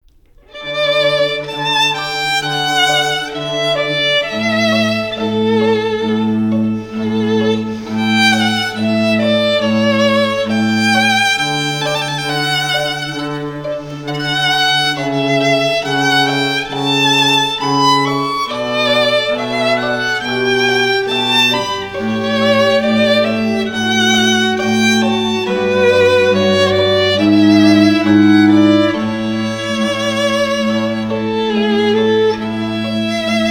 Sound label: Classical, String Quartet; Winter from The Four Seasons